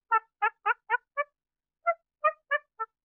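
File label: monkey idle normal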